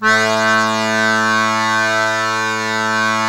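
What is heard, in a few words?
diatonic accordion A2; USB Soundscan vol.23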